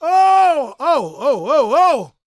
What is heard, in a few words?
shouts, gospel preacher; Spectrasonics; Vocal Planet Vol.1 Gospel; akai